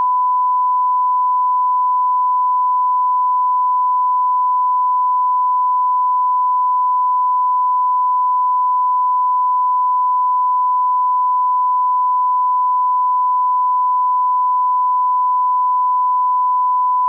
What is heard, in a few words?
sinus 1kHz